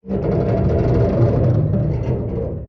damage vehicle; World Of Warships

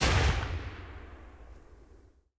mg 8mm vickers303 lastshot

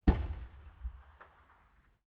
ambient shot stereo; War Thunder

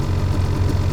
vehicle snowmobile idle

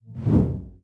whoosh prize; World of Tanks Blitz

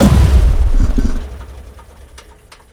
stop engine

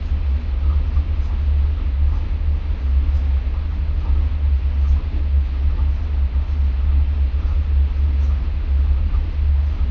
maus engine int rpm, engines tanks; War Thunder

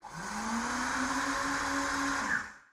Mi-8MTV2 Fuel Shut off right; DCS

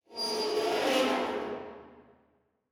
hangar layer

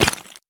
sprint tile; Escape from Tarkov

